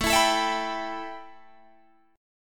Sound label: A 7th Suspended 2nd A Guitar Open D, chord